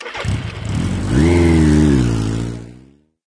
Bike Leaving

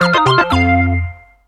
Fast music hit; Race With Ryan; PS4, Stadia, Switch, Windows, Xbox One; Lastlap

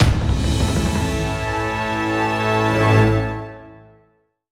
Stinger End; Skykeepers; PS4, Windows, Xbox One

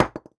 masonry hit

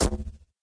Sfx - Scarecrow

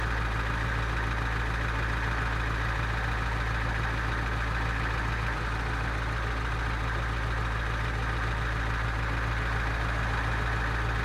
vehicle semitruck idle; teardown; ps5 windows xbox series xs

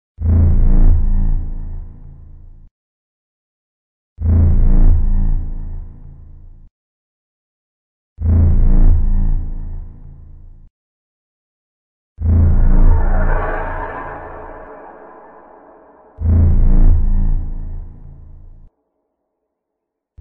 Tension; Carnivores Cityscape

